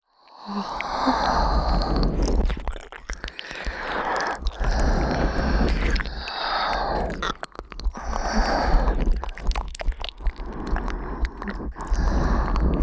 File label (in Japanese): ダブル耳舐め 左 大人乙女 右 中学生乙女